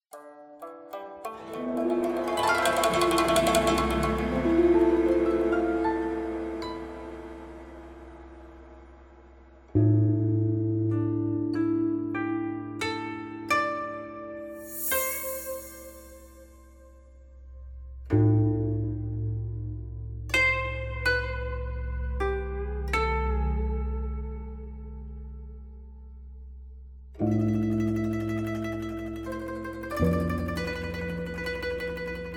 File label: menu music